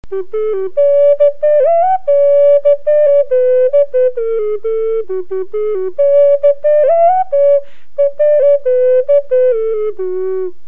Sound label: alto gemshorn F